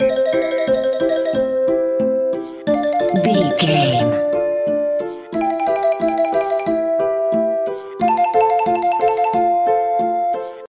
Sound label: music kids play loop